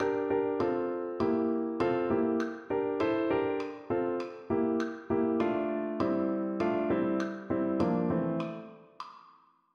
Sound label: Rock Piano